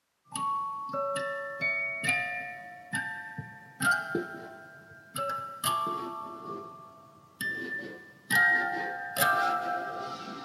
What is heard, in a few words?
Drum Song